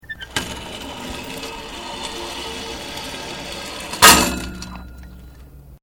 Flying fox Start To Terminal Short, Playground equipment; Sports (Sound Effects:Backgrounds)